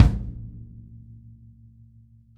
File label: kick; Sonic Reality